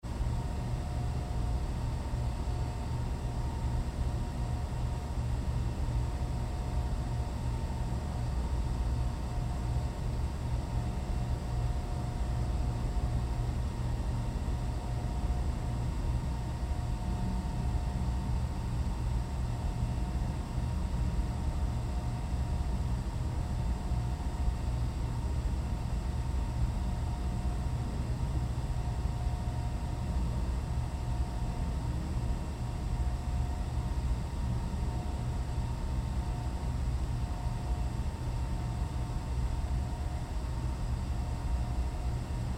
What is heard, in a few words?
Room tone Lobby Airy Rumble; Lite Whine; Backgrounds; Big Room Sound